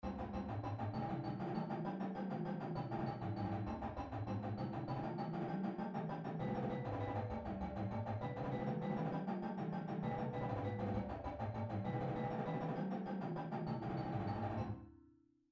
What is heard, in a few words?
Triplet Diddle, Drumline; Drumline Exercise; pdlmusic; Drumline Ensemble Series